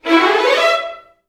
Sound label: VI RUN MJ E4, Advanced Orchestra; Best Service